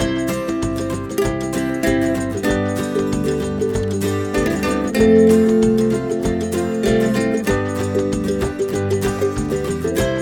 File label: ukulele sunshine